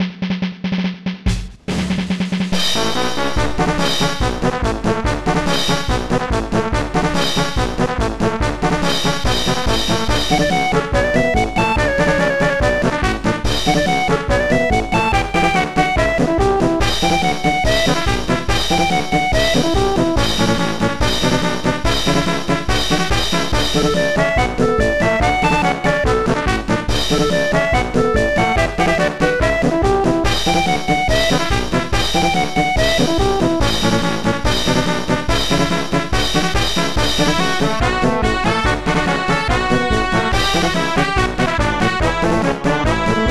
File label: march